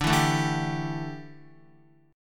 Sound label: D Suspended 2nd Suspended 4th D down Guitar; strummed; Dsus2sus4 chord